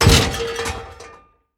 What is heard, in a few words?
Crash Objects Small Metal; car simulator 2